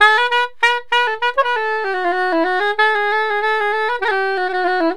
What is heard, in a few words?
THA SAX; USB Soundscan vol.63; AKAI